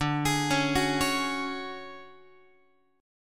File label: D Major 7th Flat 5th D down Guitar 4A 4E 5A 5D 5G sharp 6C sharp; DM7b5 arpeggiated